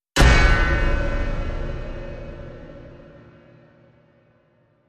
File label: Metallic Hit